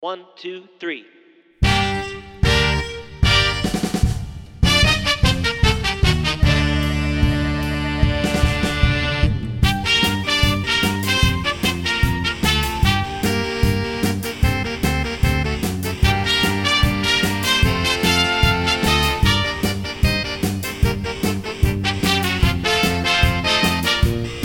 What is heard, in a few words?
Freeway Ska; Early Bird Jazz Score Demos; Produced Score Rendition